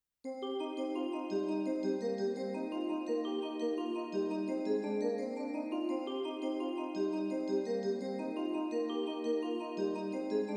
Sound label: Bell Tune; This is not really a song, but rather, what I call a musical doodle.